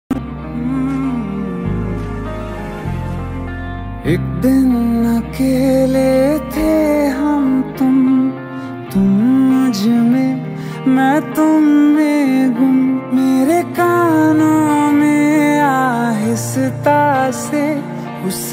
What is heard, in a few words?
Romantic Song Ringtone